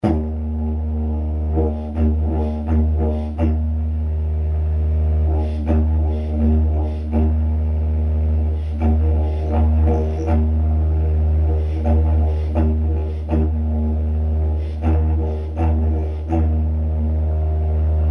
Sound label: recycle drone